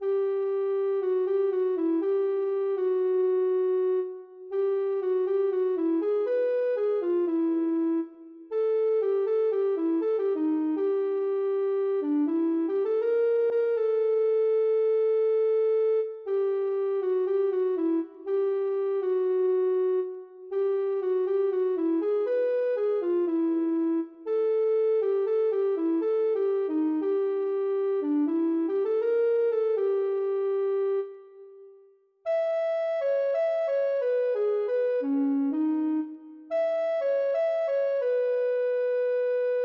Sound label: ocarina